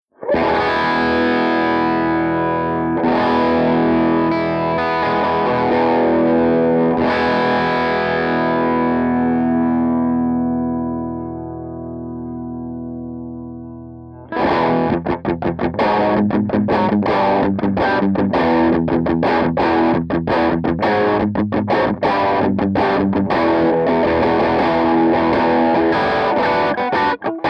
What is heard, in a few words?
AC30 DRIVE